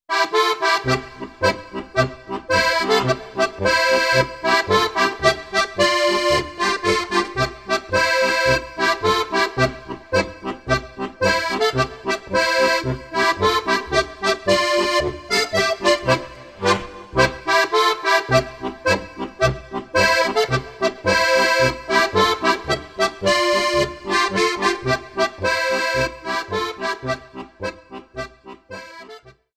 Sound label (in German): polka